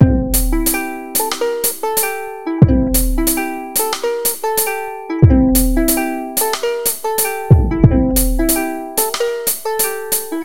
ukulele; blue ukulele; weeklybeats-2014

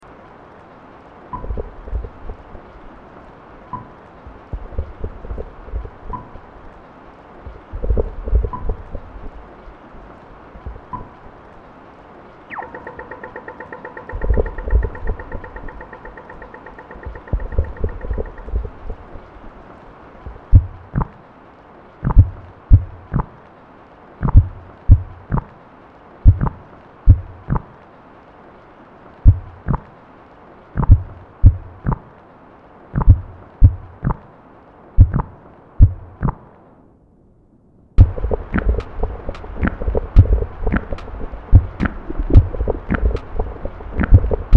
ambience de flatulence

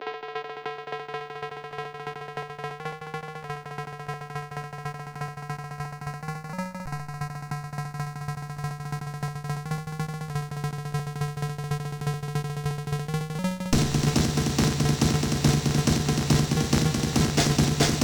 djent

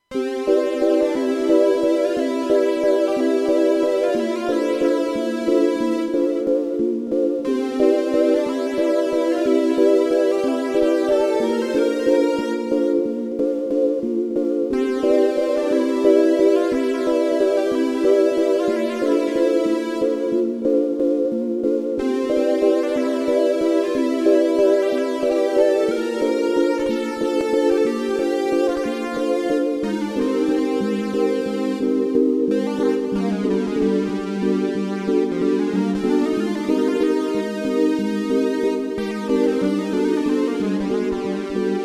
strolling music; weeklybeats-2016

